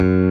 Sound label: bass